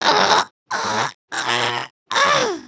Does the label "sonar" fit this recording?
No